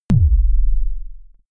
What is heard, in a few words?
sub kick noise